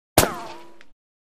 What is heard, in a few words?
Bullet Ricochet Off R